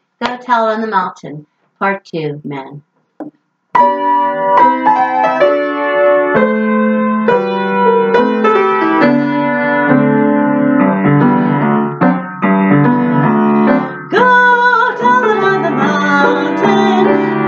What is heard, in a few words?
men, choir; gotellitnorth